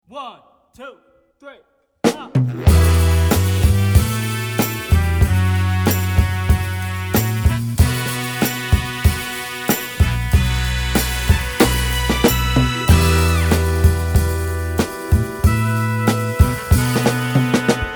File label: funk soul session